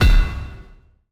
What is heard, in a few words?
Epic Metal Hit; soundscrate